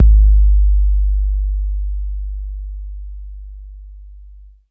K 808, KICKS TR 9+8

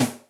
Fantam, Electric Snare, Yamaha DTXpress

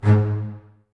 BSS G#2, String Ensembles, Basses, Stacc; Miroslav Vitous; 90 s Sample CDs

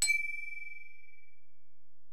Bell, Alesis DM-PRO

